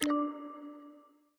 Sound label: Lock